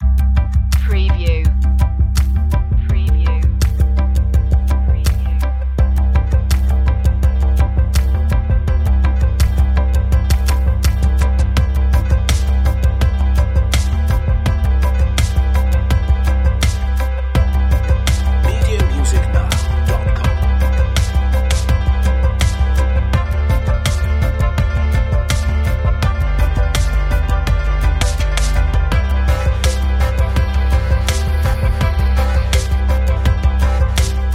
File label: deep bass